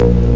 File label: Lift bass looped